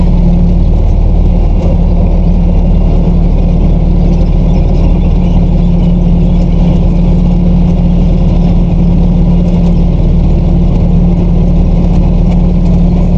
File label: Vehicle Engines; Red Orchestra Ostfront 41-45